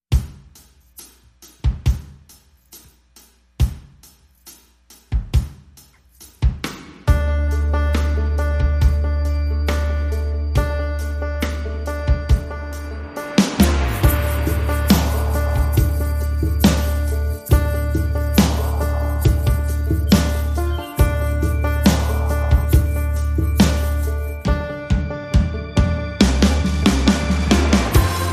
No Guitar

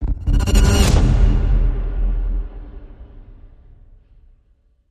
Hit Wipe Out

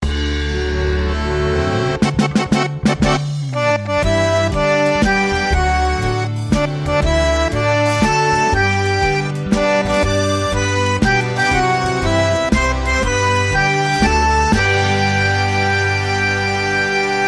Accordion Happy Birthday

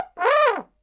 Trombone Wolf Whistle

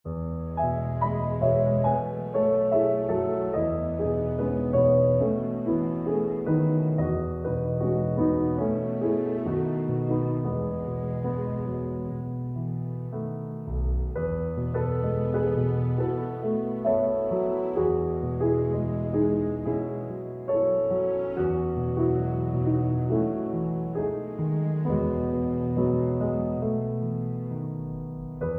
With Strings

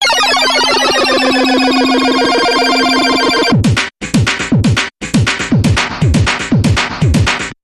Computation Space Bumper

